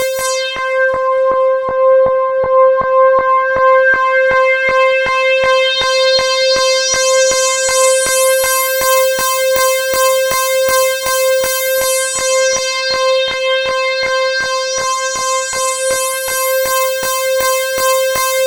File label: C5, SynthLine; 90 s Sample CDs; NiceBeats; SynthLine VOL-1